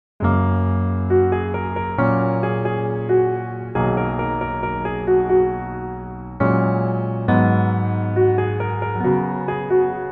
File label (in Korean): Piano ver 멜로디MR 남자키